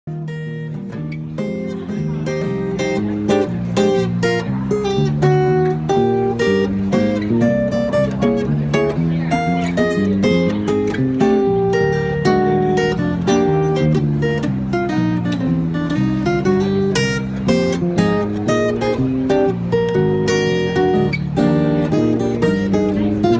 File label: With Restaurant Crowd Noise